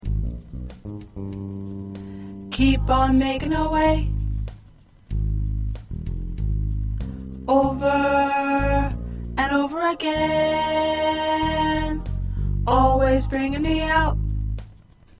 Tenor, choir

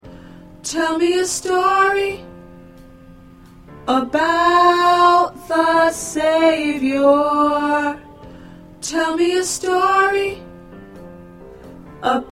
Alto

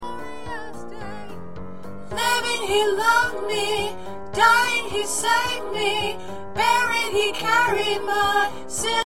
Alto

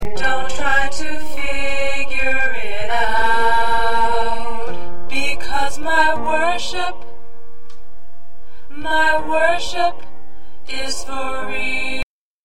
Alto, choir parts

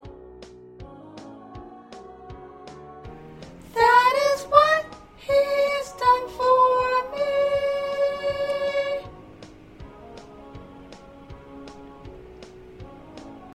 Soprano

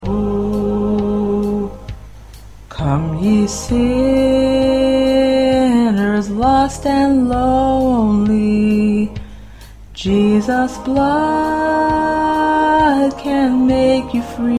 2nd Tenor

